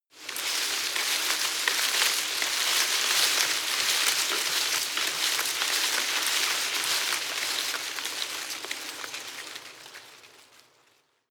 tree small leaves rustle medium

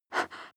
breath female short

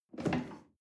chair wood take medium

